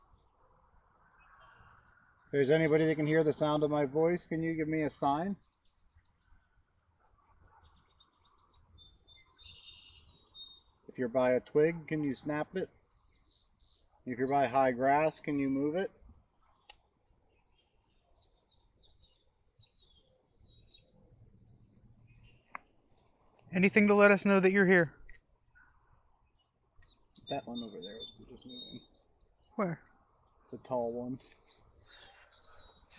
Burial Stone EVP

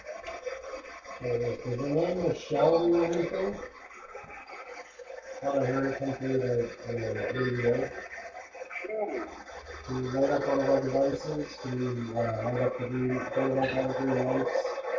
Womans Room Spirit Box; wexford general store antique shop return investigation

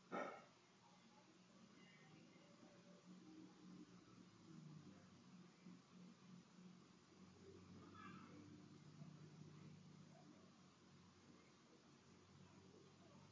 Empty Room

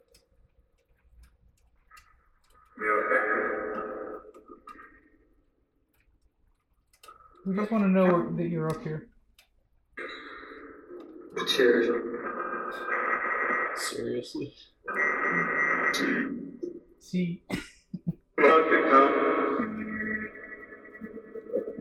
Attic Phasma Box; Irish-Townsend House – Investigation

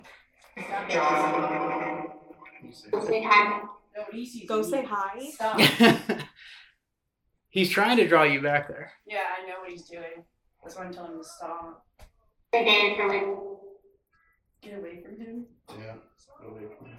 Tattoo Shop Phasma Box

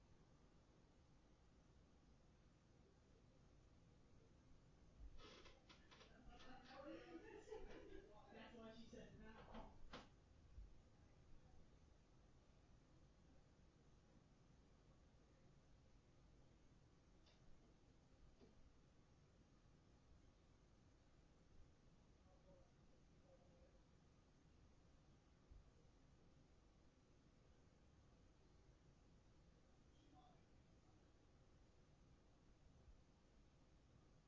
Static 2nd Floor Bedroom

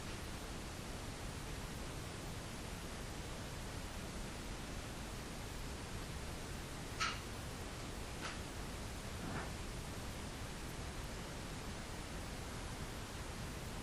Tattoo Shop Static

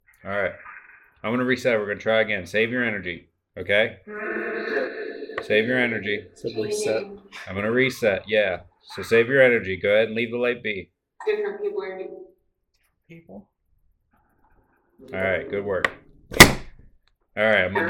Tarot Card Room Phasma Box

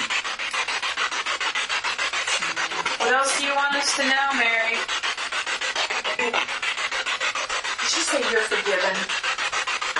Spirit Box; Civil War Wing 3rd Floor Across form Armour Room; madison seminary investigation

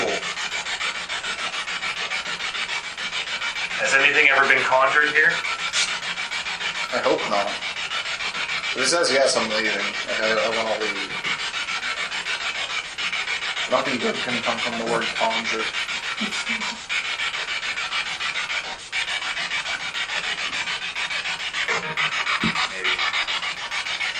Seance Room Spirit Box; wildwood sanitarium investigation

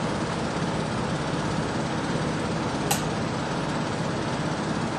Inside Tattoo Shop

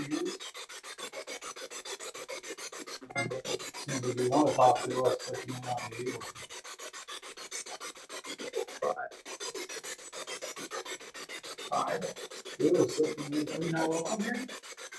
Janitor Room Basement Spirit Box; poasttown elementary school investigation

